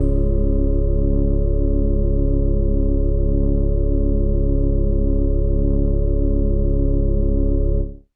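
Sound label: Indie Organ Kawaii C#1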